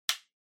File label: Power Strip Hand Switch